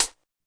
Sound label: classic hh H; STYLE KITS (Hip Hop, Etc); Classic Hip Hop Mega Kit